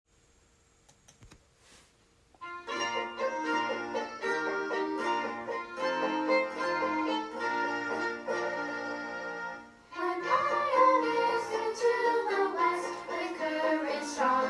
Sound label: vocal